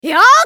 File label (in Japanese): 攻撃; ミフユ